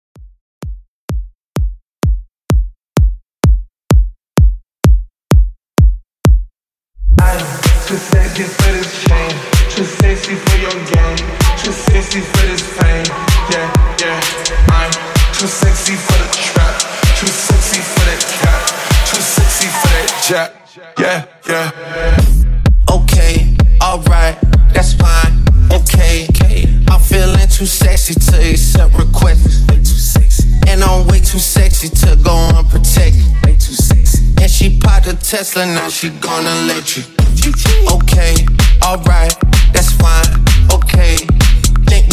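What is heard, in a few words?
Intro Outro 128 Bpm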